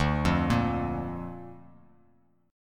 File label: D-Minor Sharp 5th, Dm#5 chord; Dm#5 arpeggiated; x,5,3,3; JGuitar